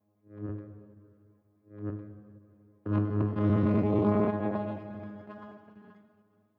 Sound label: 125 G Synth Portal; Wire